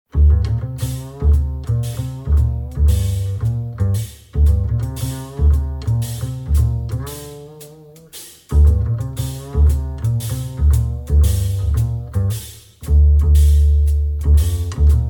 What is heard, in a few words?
Blues